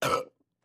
Shrt Airy Belch

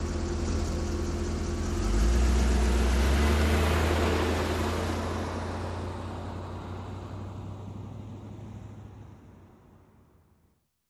Car Compact Drives In Idles Away

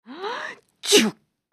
Female Sneeze Polite

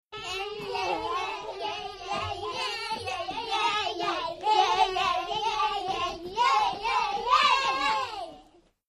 6 Yr Olds Dance Sing Ye

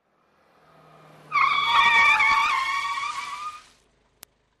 Car Skid Short Medium

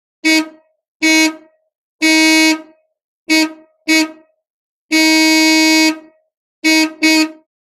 Horn Ford Prefect Cir

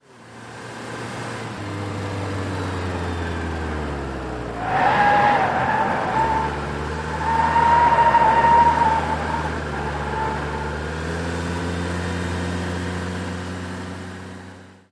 Car Cornering, compact

